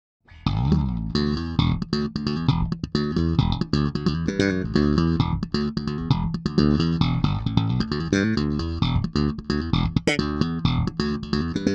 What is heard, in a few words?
some slap sounds